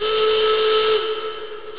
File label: klaxon